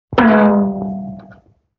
Can Hit; Vintage Cartoon; Drums & Percussion

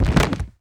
BF Comp Wood; Fairlight Sound Lib

